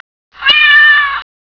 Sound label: whipper